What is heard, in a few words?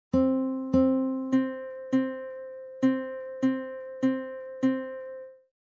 Fermata Regular